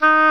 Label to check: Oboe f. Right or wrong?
Right